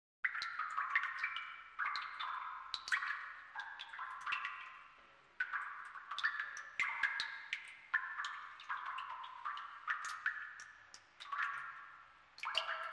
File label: Water Drips Tank Echo, drips